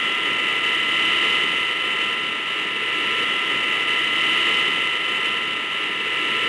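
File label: conveyor belt; XBOX; Crash Bandicoot The Wrath Of Cortex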